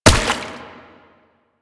Step Water; Warframe; Proto Infested Brachiolyst

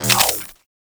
Glaive Un Equip; Crp Glaive

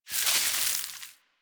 Hoverboard Terrain Caster Snow

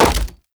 Hammer Impact Wood; Weapon Impacts; Player; Environment; Tuvalkane Hammer

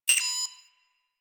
Tech One Off, Ambience; Warframe; Rail Jack

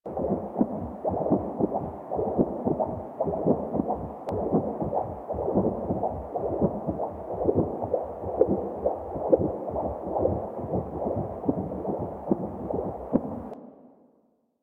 Vein Beat; Ambience; Props; Warframe; Infested Eris

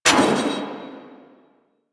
Spear Gun Mech; Weapons; Warframe; Tenno; Hoplite

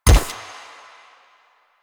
Shot Mech; Warframe; Akarius Prime

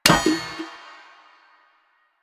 Street Sign Destroy; Ambience; Props; Warframe; Nineteen Ninety Nine

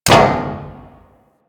Ship Hit Effects; Warframe; DE; Vehicles; RailJack; RailJackHitEffects

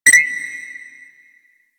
Security Camera Soft Detect Stop; Ambience; Warframe; Grineer Fortress

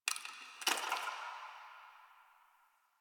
Ice Crack One Off; Warframe; Ambience; Nineteen Ninety Nine; DE

